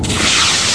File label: jump; quake2; bobafett